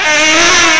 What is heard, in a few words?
buzzing